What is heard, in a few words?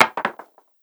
dice coll last; netent